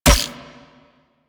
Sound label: Mech A; Warframe; Weapons; Infested; Inf Cernos; Prime Derelict Cernos